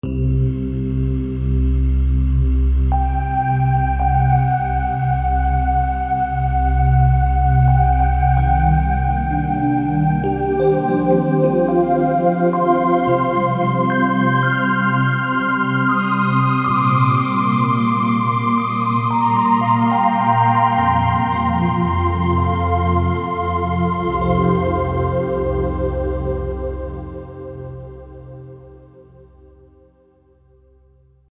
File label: Future Pad, Roland U-220; Factory Patches